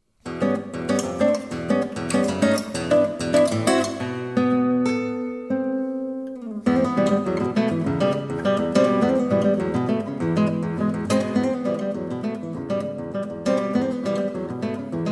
Tre Preludi Per Trio Di Chitarre No. 3. Preludio Sopra Una Scala Enigmatica; Classical Guitar